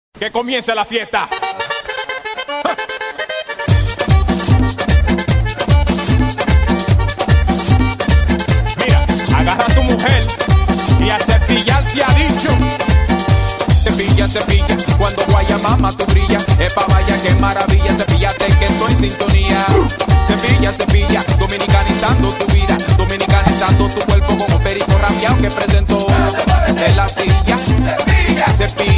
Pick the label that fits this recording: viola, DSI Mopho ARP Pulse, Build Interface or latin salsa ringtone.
latin salsa ringtone